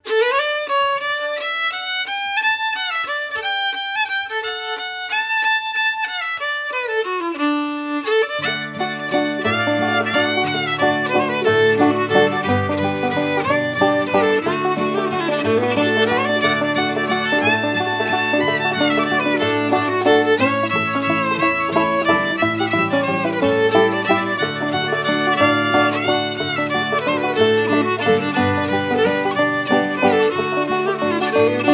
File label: Cowboy Waltz